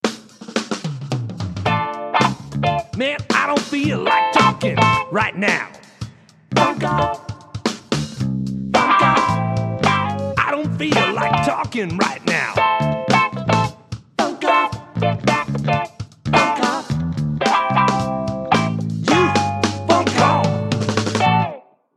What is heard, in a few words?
Funk